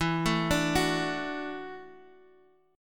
E 7th Suspended 2nd Suspended 4th, down, Guitar, Half Step Down; E7sus2sus4